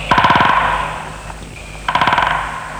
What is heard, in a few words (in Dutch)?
Grote Bonte Specht Dendrocopos major; vogels park bos